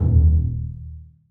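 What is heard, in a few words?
basses pizzicato d#3; Sonatina Symphonic Orchestra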